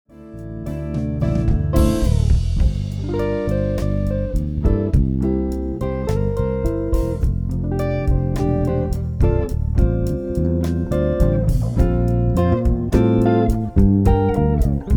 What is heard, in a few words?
Bass Solo